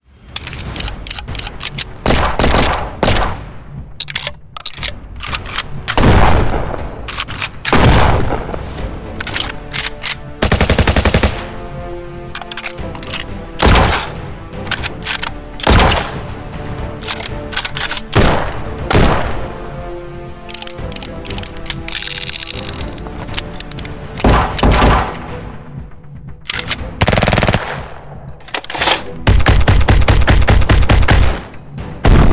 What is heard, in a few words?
WARFARE SOUNDS; Gamemaster Audio